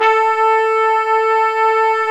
WND FLG SO, Hybrid Winds Flugel & Sop

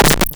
AC Buzz; Cwejman S1; DevSnd Cwejman S1 - JK

